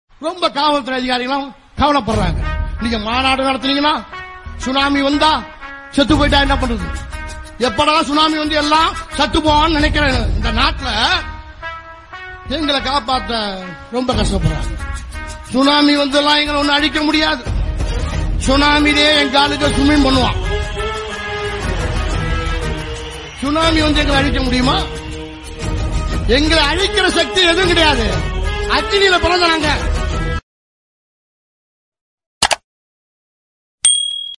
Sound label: songs in tamil